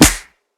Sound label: Snare; Kount Drums Vol 5